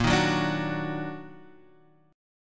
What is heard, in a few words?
D sharp Minor Major 7th A sharp